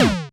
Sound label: sfx grenade fire; Duck Game